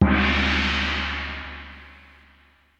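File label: Large Cymbal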